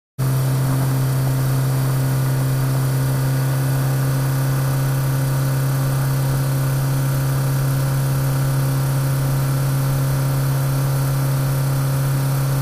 4 Cyl Sports Car Stead

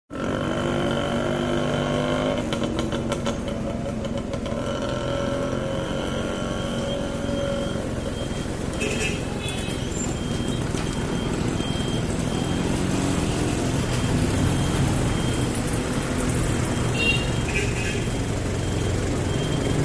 Na Thon Thailand

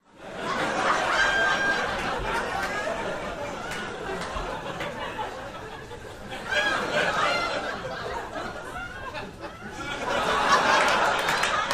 Laughter Appls Med Cr; medium

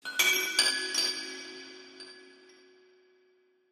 Chinese Chimes Bells; mallets

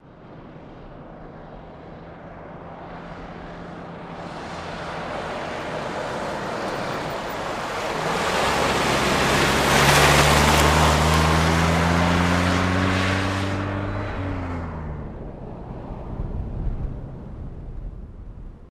Jeep Cherokee 84 Slw Pass

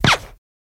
Machine Gun Silenced, silenced bursts

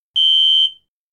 Alarm Whirring Synt; hi-tech-various

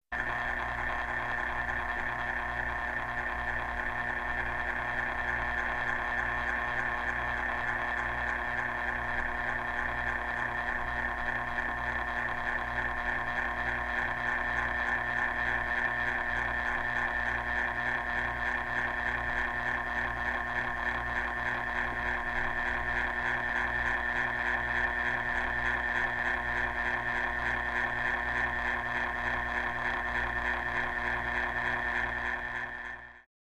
Clock Electric Old

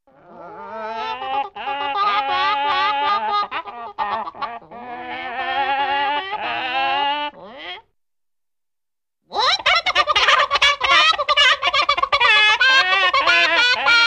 Two Chickens Cluck

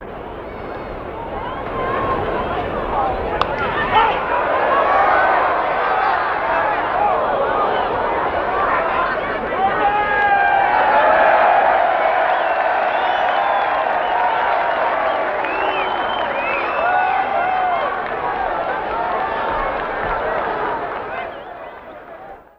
Baseball Crowd Home R

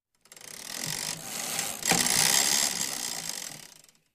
Bicycle Ten Speed Bic

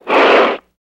Horse Bull Snort Hard

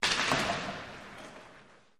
Ice Hockey Hits & Impacts

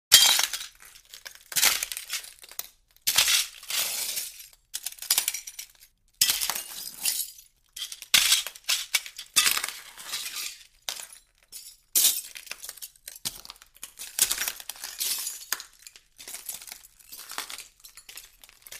Footsteps Broken Gla

